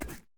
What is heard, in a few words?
multi swap, shelf